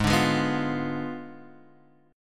G sharp Diminished 7th G sharp, down, Guitar, Half Step Down